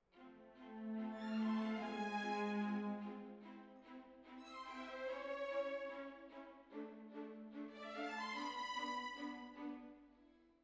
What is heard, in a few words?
Hall, Jazz for piano